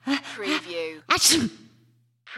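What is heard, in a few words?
Female Sneeze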